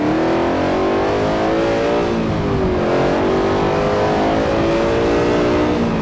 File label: second, vehicles; TDMCarpack; slsamg